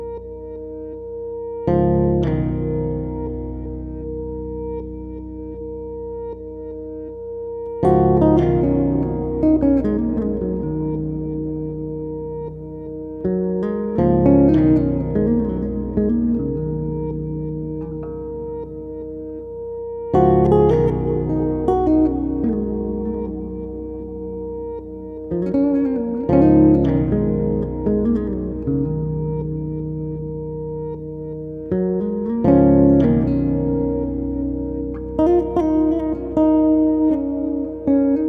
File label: Bass guitar loops; Twice Music